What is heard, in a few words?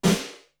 AMBIENCE, ROOMS